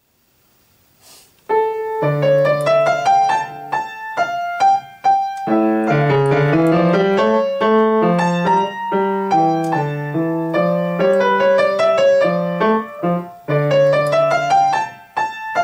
menuet minor; lully jean baptiste

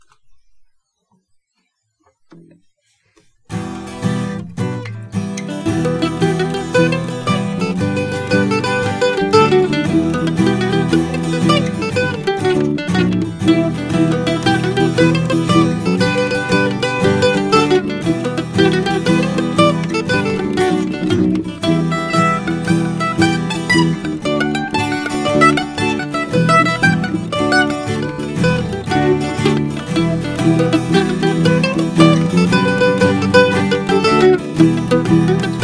jig

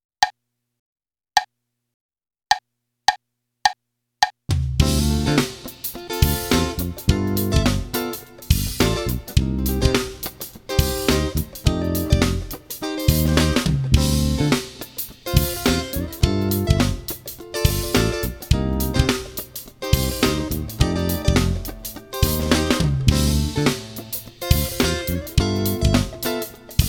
funk groove; bergeron guy

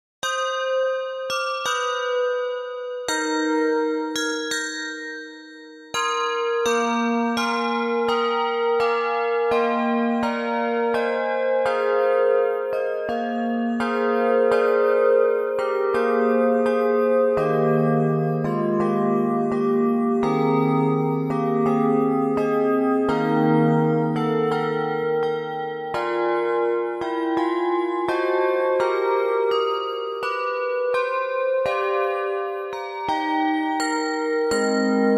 for english handbells; Calkin, John Baptiste; I heard the bells on Christmas day

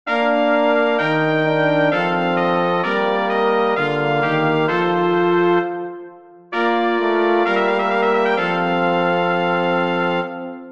chorus for brass quartet; bach johann sebastian; der aber die herzen forschet

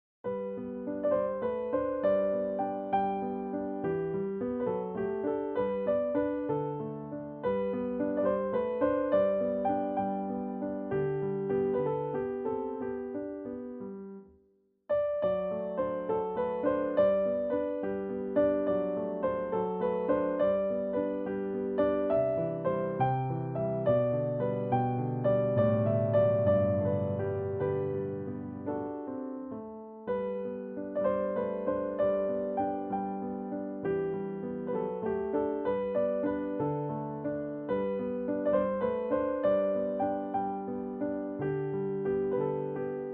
romance; kozeluch leopold anton

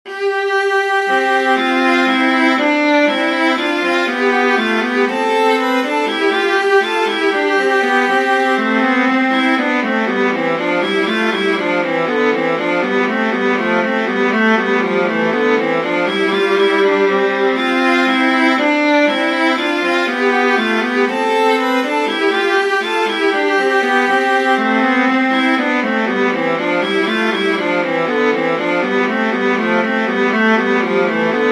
canon major for viola duet; bach johann sebastian

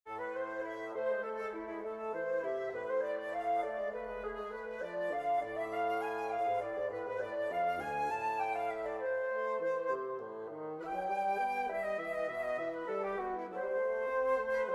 prelude minor for flute bassoon; bach johann sebastian

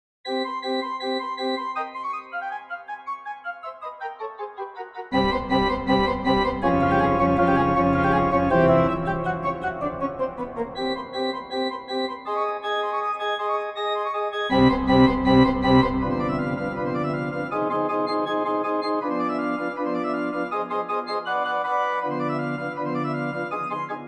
organ transcription

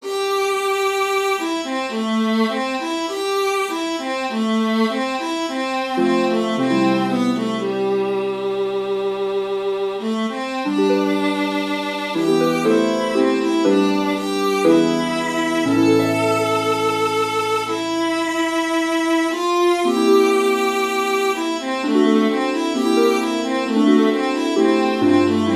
for viola harp; Claude Debussy; la fille aux cheveux de lin

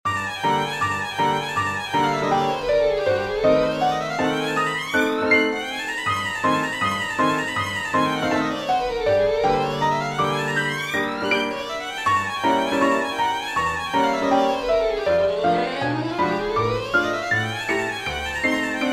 allegro for violin piano; Raff, Joachim; progressive etudes

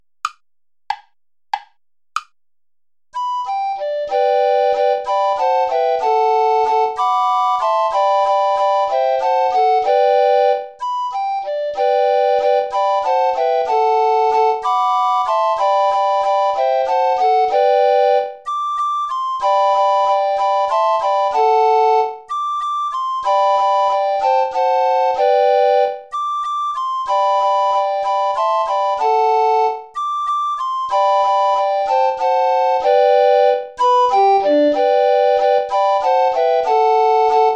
play along without bass recorder; traditional asikhatali

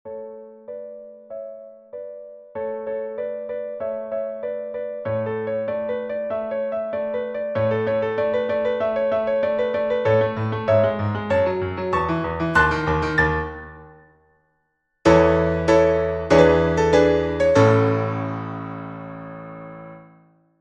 allegro

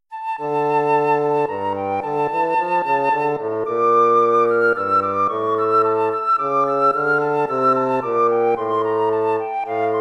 courante

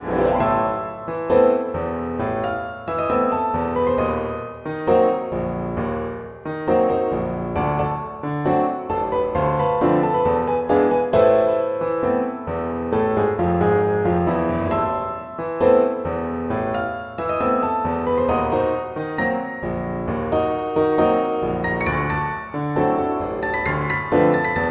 tango minor oriental

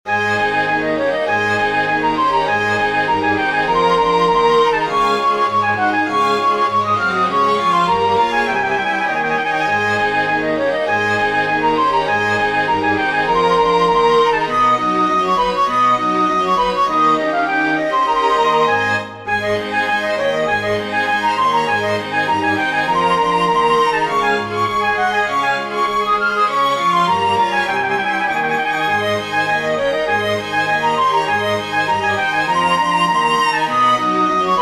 for flute strings; bizet georges; les voici; from carmen

